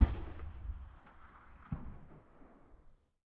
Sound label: ambient shot stereo